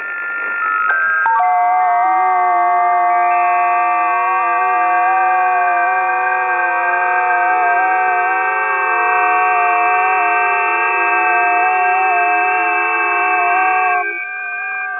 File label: 20m busy, ft8